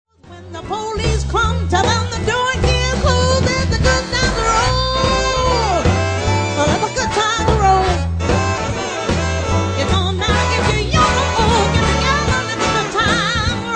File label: Shuffle